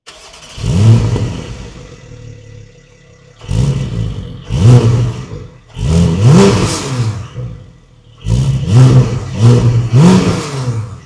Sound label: porsche cayman engine sound, 718 GTS 4.0